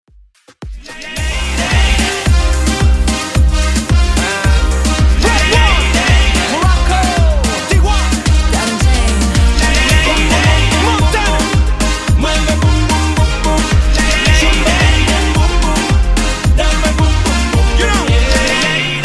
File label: Spanglish Moombahton 110Bpm